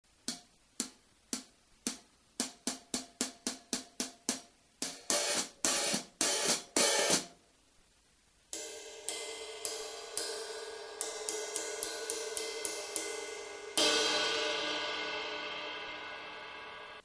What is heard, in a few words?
hot cym, brushes